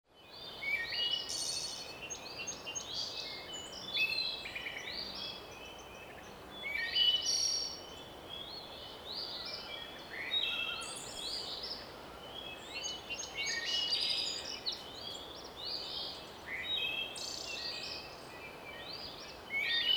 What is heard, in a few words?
bird